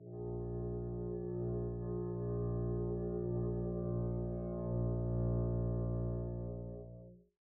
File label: arco bb1 pp down, double bass; dsmolken